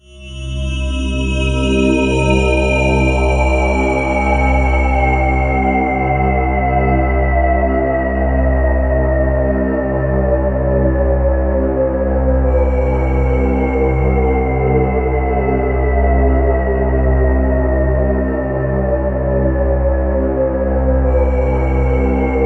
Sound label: C1, SynthLine; NiceBeats